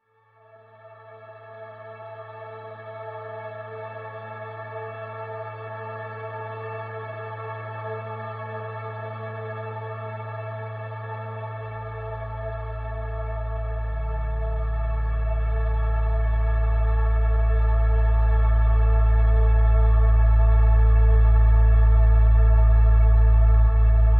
Mysterious Drone; Nordic Nights Drones

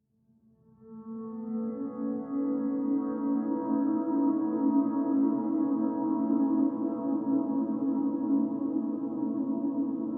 Beautiful Drone; Nordic Nights Drones

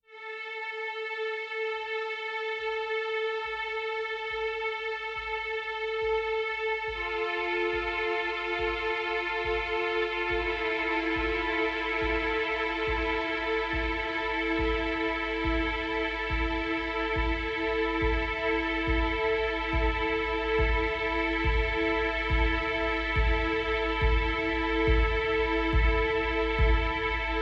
Pulsing Drone; Deep Nordic Drones

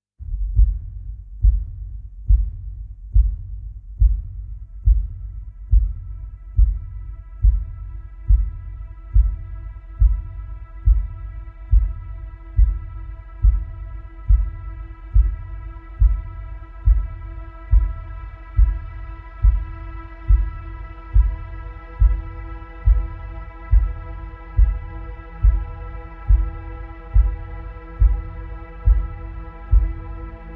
Deep Pulsing Drone; Deep Nordic Drones

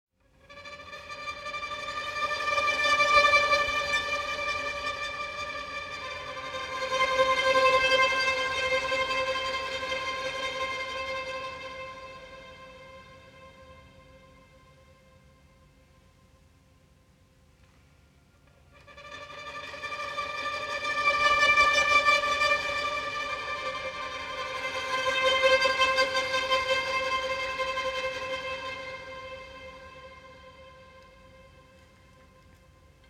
Shimmering String Drone; Nordic Drama Drones

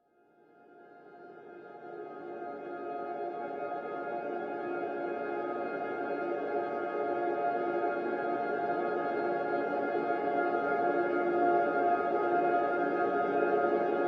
Neutral Drone; Nordic Nights Drones

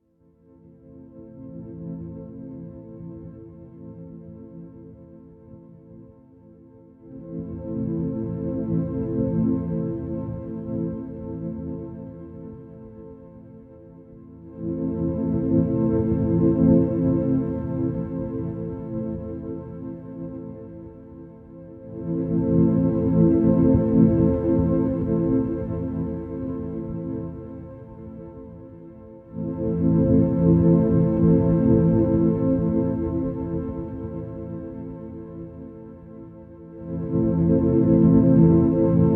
Shimmering Synth Drone; Nordic Drama Drones

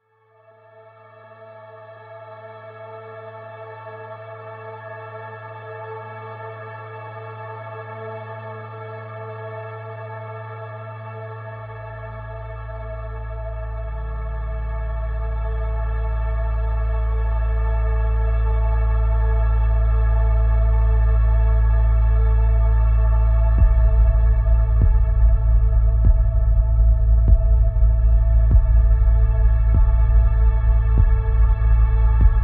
Pulsing Drone; Nordic Nights Drones

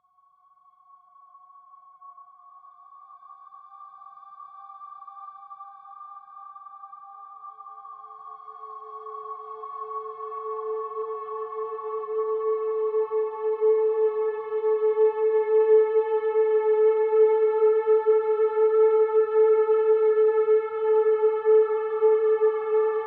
Synth Drone; Nordic Nights Drones

